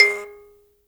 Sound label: HIBZ KL G#4, HIBZ KALI; Heart of Africa